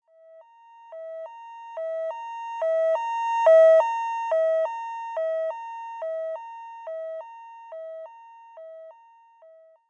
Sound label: Fire Truck Siren Pass By; Emergency Vehicles; Lynne Music